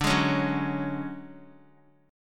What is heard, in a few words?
D Major 7th Flat 5th D down Guitar 4F 4A 5C 5G 5C 6E; DM7b5 strummed